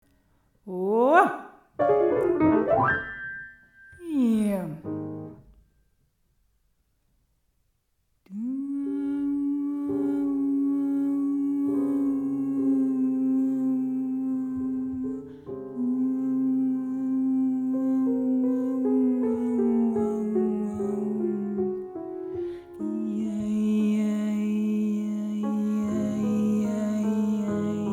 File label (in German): Klangspiel